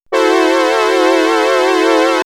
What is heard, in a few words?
brass, horn section; Prince Brass; X-Static Goldmine; backtothe70's